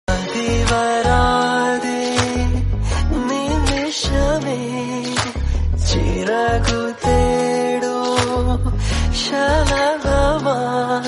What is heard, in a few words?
brother sister love malayalam song